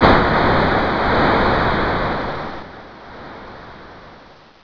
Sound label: SPLOSH